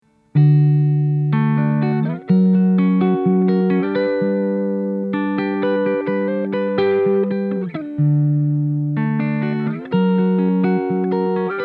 clean 3 pos, Clean, all three pickups; recorded raw; tc electronic

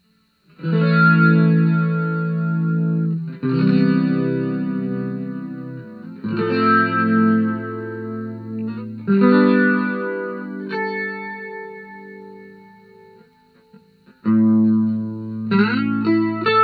All controls dead-center, chorus, guitar; Squier CV Tele 50's (middle pickup position) into my Aracom VRX22 and a 1 X 12 with a Jensen Jet Falcon

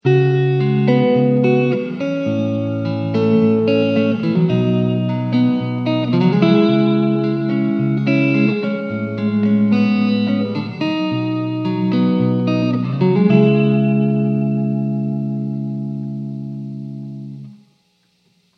rv5 gate, guitar effects

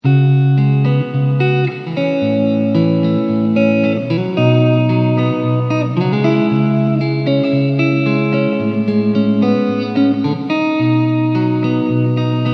plate; rv5

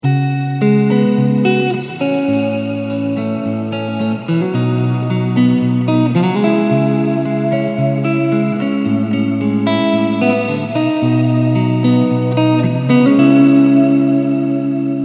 rv5 mod, guitar

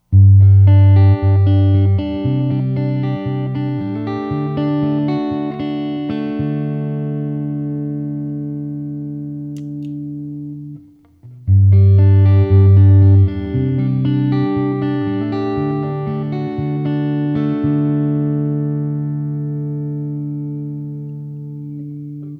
amb wet 100 decay 3pm; tc electronic

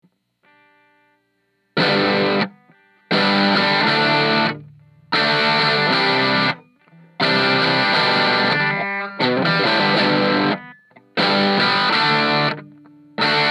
boost tele, guitar effects

